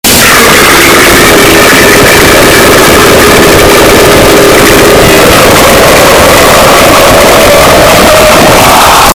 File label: fat man burp; made with Voicemod